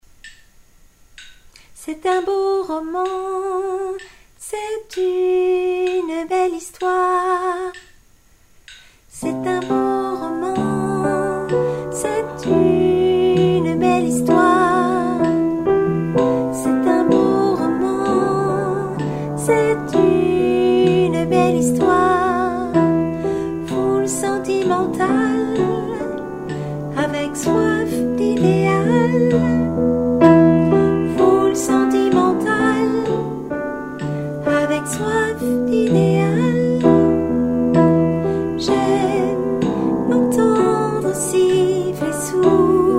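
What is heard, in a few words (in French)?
soprano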